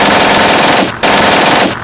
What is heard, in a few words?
weapon